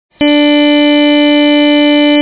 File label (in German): Geige D gestoert